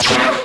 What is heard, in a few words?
laser generic; weapons